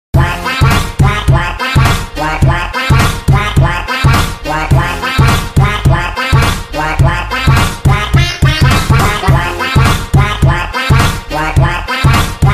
Swing quack

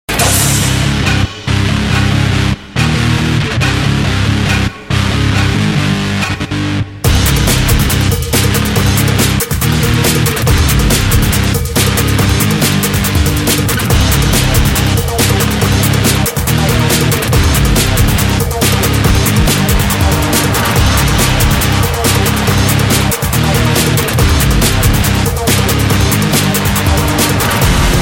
Heavy music

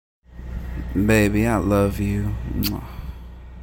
notification

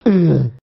UUGGHH; Swaggersouls

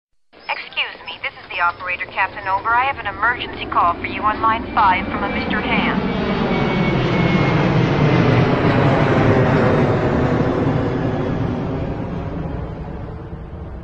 Airport

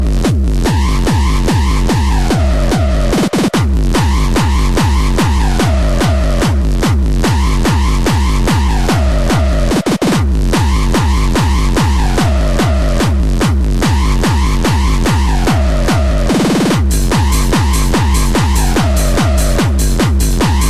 Techno Shuffle

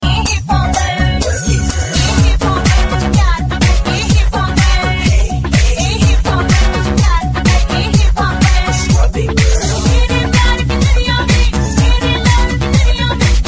Hiphopper

